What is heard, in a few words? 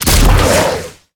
Tongue Grab Attack; Evolve; Behemoth